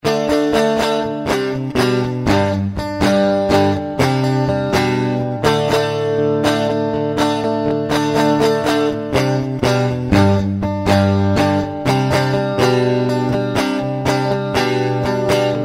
piezo electric

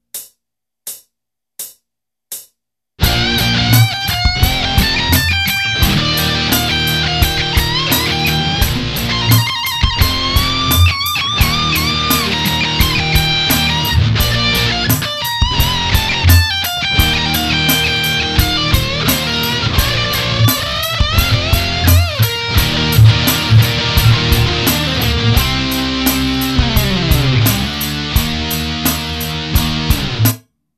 solo

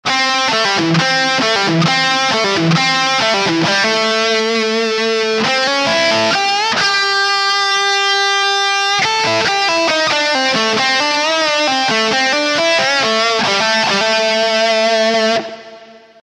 lick slomo

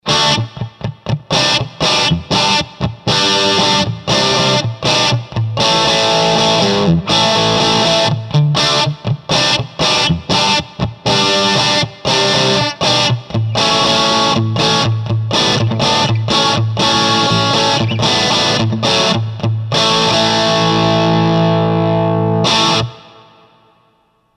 ad50vt uk 70s; vox

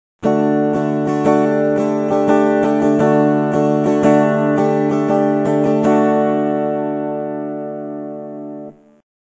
Dsus4, guitar

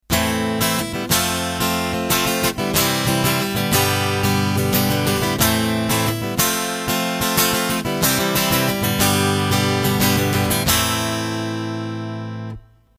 godin piezo; godin lgx

